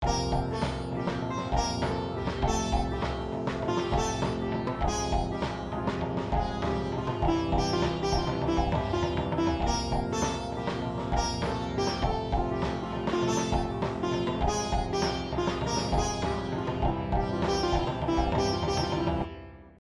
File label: sitar